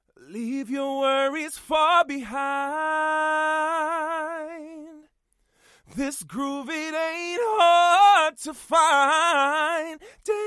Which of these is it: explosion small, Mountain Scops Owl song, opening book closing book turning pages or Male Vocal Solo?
Male Vocal Solo